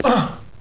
player jump; Daikatana

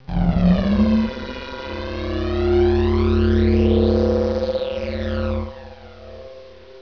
plasma; TNPDEMO

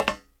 Metal; kult

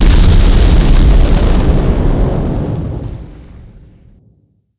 Blast; ImpGal2; groundwar

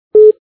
tone click; Space Simulation Series; Liftoff

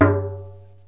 mini drum, cartoon; Wired for Sound Pro CD; Aristosoft, Inc.

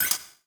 DRUM SHOT HOUSE 125; eJay; Singleshots

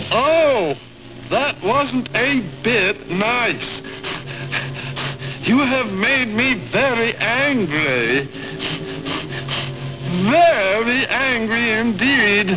Martian

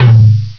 mid tom; Flashback

